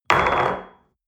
espresso place on table hard wobble, restaurant cafe